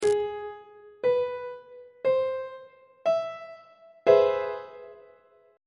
b sharp augmented major 7th 2nd inversion on treble clef